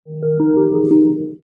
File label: sound open